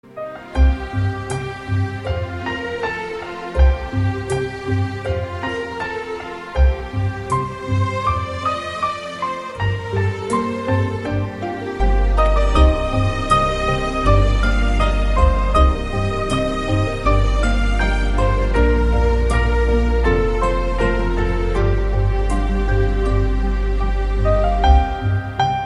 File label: Ringtone, Tamil Ringtones; Mounam Paseyadhey; 2009 Tamil Hit Ringtones